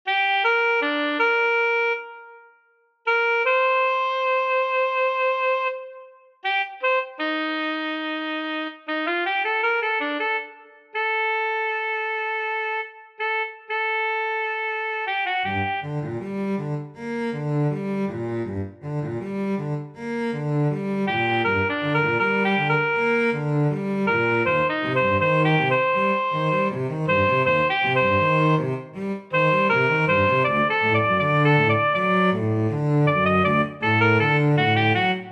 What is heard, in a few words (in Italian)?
Melodica Emozione, MIDI